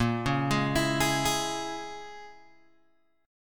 A sharp Minor 7th Flat 5th, down, Guitar, Open G

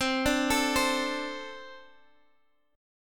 C 6th Suspended 2nd C

